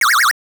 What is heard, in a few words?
COIN; Super Locomotive; arcade